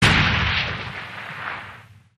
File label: troika fire stop, turrets; gow